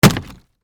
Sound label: Wood Hit Sledge, Impact, Wood, Hit, Sledgehammer; Hammers; Tools; BlastWave